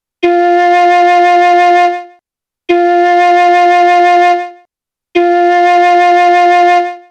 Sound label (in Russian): 1 oktava 4 fa flejta